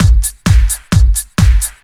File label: drum 130 techno; Techno Trance Essentials